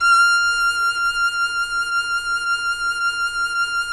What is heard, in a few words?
FIDDLE NOTES, F6, L